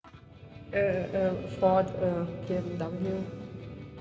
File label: chapel near music; enmf